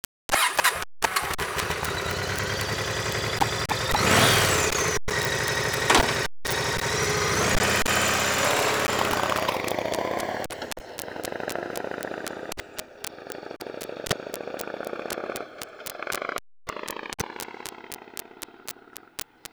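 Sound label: Yamaha XV 1600 Wildstar, motorcycle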